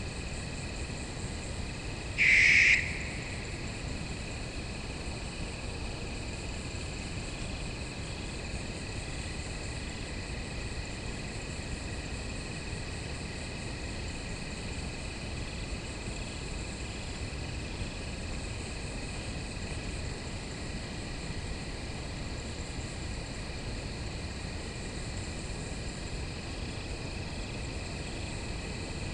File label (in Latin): tyto alba; john.atrent